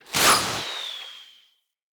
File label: Bow Arrow By Charged; Weapons; Josalfar Bow